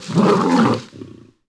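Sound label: lion attack vox; Civ4 warlords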